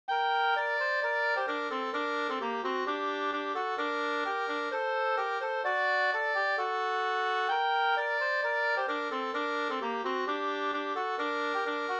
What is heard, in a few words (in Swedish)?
schottis; Kyrkschottis av Pelle Schenell 2st V1